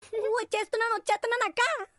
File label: Ringtone